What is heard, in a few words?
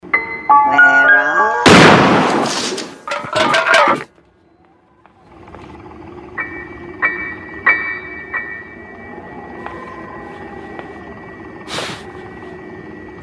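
sound of body falling and scary sounds; Grenny zastrelili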